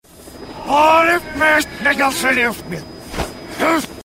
fiddle

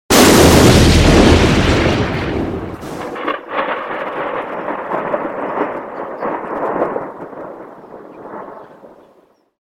explosion

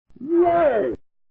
WOAH

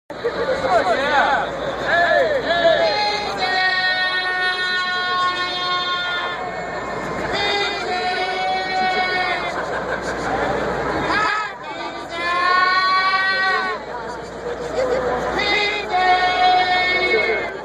pizz

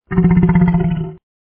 burp

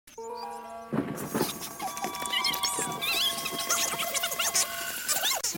frying pan

